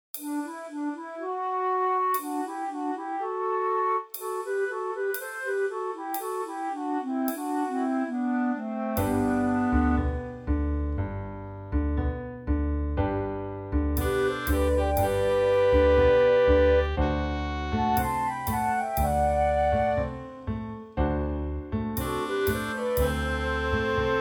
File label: KARAOKE